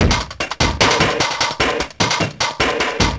Beat, Industrial

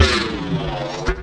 Korg, FX

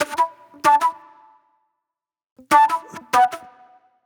Pop Flute

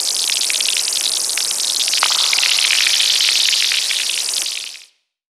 synth sfx; Chillout (ambient1&2)

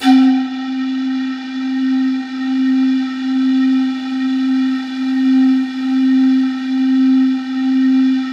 C3, ANALOG SEQ; Roland JV 2080

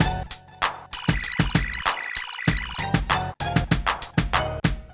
PHATT BEA, Hip Hop

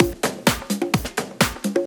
Latin Loop 128 BPM

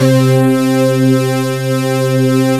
SYN BRASS, swing synths; Zero-G - Funky Elements

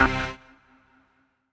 CHORDS; EastWest; Dance Mega Hip Hop Electro

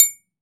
triangle; AKAI S-Series CD-ROM Sound Library VOL-5

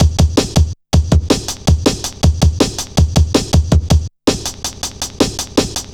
Boy, Drum Loops, Jungle, 161.5; Zero-G Jungle Warfare 1, 2 & 3

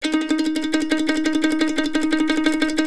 C E trill D#, Blues Guitar

